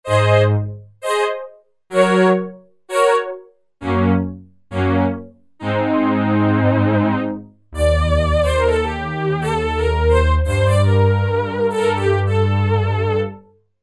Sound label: 2 voices; Korg MSC-12S Synth 3